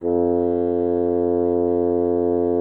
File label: bassoon, F2; Xsample VOL-6